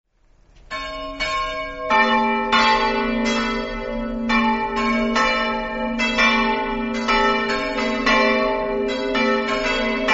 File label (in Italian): campomolle, campane